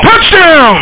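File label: lemmings ship explosion; lemmingoids; ship bump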